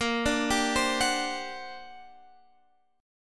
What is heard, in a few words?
G Minor Major 11th, A sharp